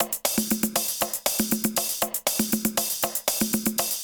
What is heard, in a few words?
Beat Perc loop; Vostok Inc.